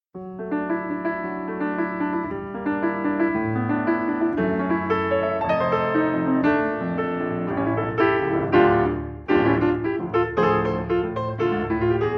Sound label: piano